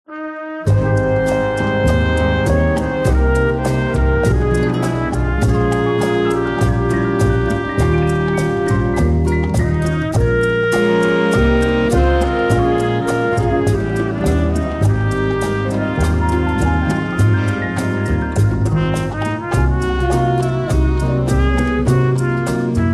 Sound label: Mexican Dance Music; mexico